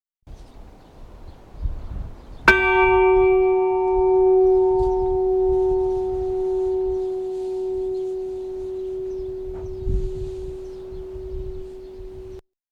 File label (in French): cloche; Aulon